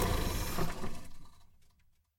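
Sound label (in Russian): приглушенный звук глохнущей поломанной машины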